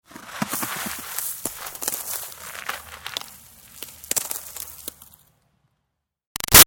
Snow Dust Drops Ice Debris Long; Weather; BigRoomSound

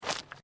FOOTSTEPS SNEAKERS UNDERBRUSH STEP; Footstep Foley; Footsteps (Sound Effects:Human)

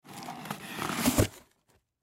Cardboard Box, Cardboard Box Unfold Top Open Up; Blastwave; Foley

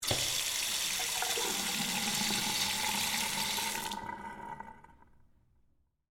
Sink Run Bathroom, Public; Office; Public Bathroom Sound Effects; BlastWave

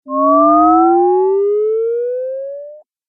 Game Fx Growing Sound, Video Game Sound Effects

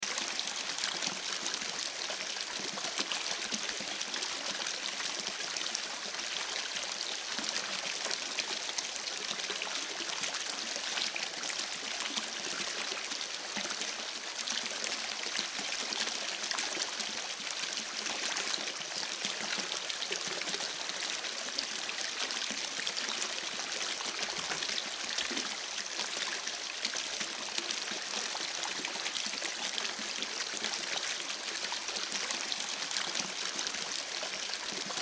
Stream Babbling, Stream Babbling Brook; River Sound Effects; Rivers (Sound Effects:Nature); Blastwave

